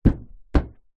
vehicles car bonnet hood hit footstep bang ext

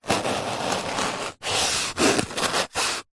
foley cardboard box lid flaps rub scrape together

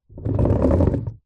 leisure rollerskate short roll wooden deck

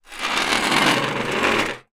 foley metal rod scrape on metal step ladder; zapsplat

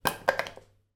household soap bar drop into sink

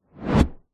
hit whoosh fast swoop

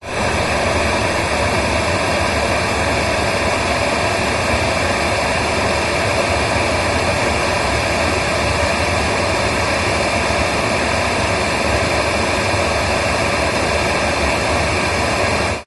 car radio static internal nissan patrol; vehicles

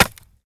industrial tools axe chop wood; sound effects one

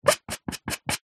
sport snooker cue chalk up squeak; zapsplat

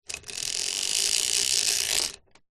household blind shade pull cord close up; zapsplat

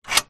household gate bolt movement

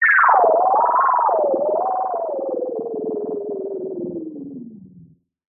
synth space weird synthy sci fi power down robot; Jessey Drake

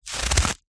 foley footstep single boot wet slushy snow; zapsplat

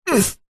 human male short grunt through nose; zapsplat

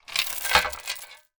industrial chain med pick up from metal surface; zapsplat

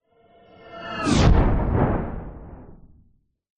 science fiction thud hit whoosh

